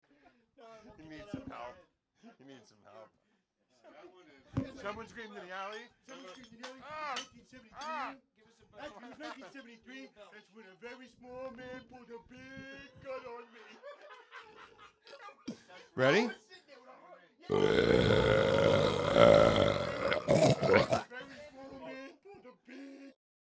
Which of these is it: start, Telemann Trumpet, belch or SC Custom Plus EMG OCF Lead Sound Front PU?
belch